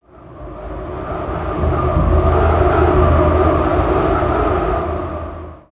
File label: Deep Cave Ambience